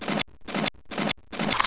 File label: gun, weapons